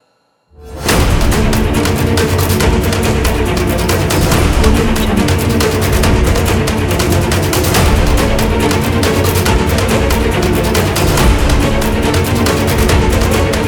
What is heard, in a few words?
action fast chase